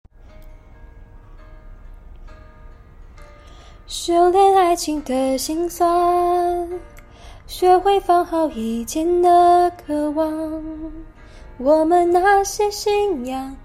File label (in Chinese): 女歌手唱歌, 华语